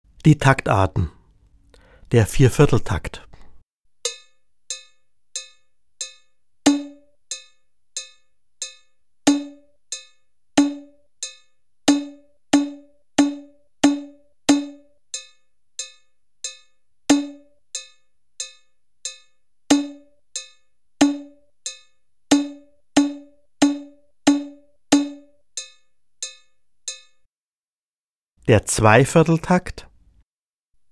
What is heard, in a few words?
Bongos Meter